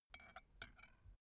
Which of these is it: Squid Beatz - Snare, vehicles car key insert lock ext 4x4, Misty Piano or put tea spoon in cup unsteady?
put tea spoon in cup unsteady